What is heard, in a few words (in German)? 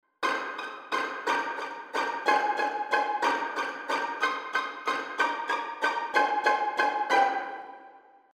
String Ensemble Violinen Pizzicato